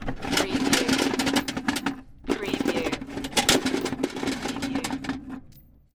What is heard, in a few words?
Chain Drag on Metal